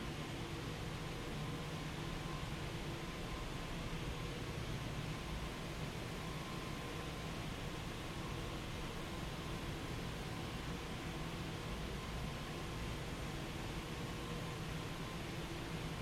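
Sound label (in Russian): endless long rustling sound of production work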